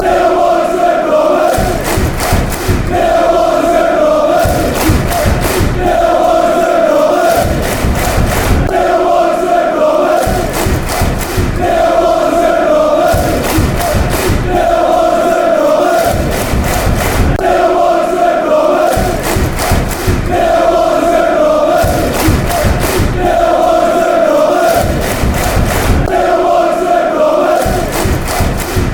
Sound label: red and blue army, fan chants; neil warnocks